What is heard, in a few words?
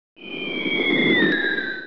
bomb fly